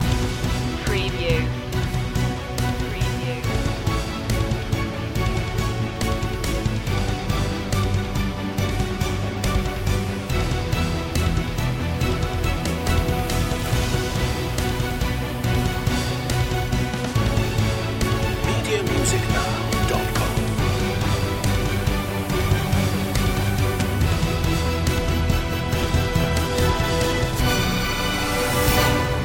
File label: no choir